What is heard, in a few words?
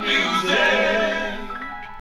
VOCALS; Ueberschall; Jam Box